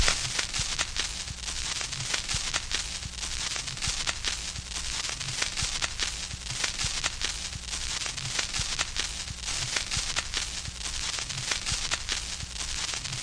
scratchy record dirt; Sound FX Real Life

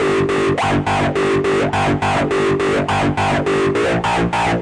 rude synth; SYNTH AND ELECTRONIC LOOPS

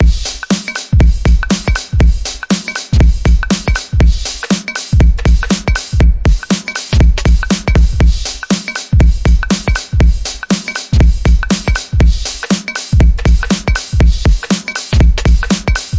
120 bpm, fun n funky, drum loops and breaks

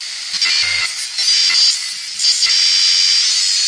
noise melody

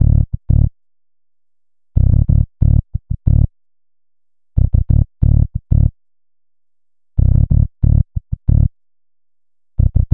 092 distorted funky ebass, bass loops

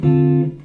mellow jazz guitar, Mellow Jazz Guitar Chords

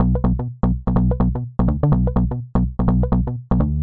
125 jumpy mellosynth bass, bass loops

